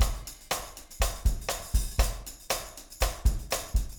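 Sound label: rim beat hh tam 4 4, Room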